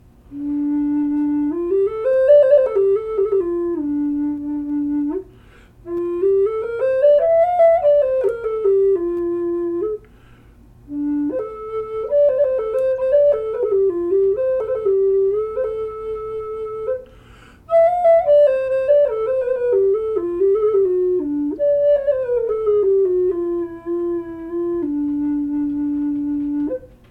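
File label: D4 Spalt Birch branch, Native Style Flutes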